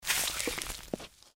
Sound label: eat